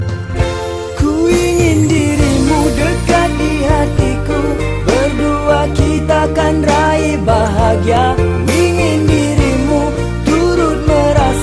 Chorus

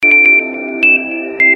Professional Sms

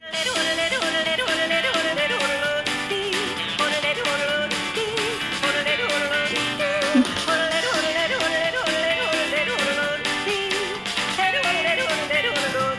Yodeler

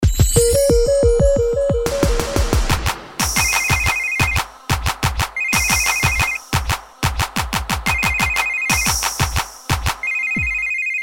Ring Drum Beat

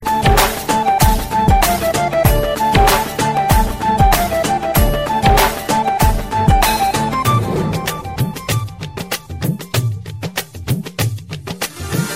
Flute Tabla Rhythm

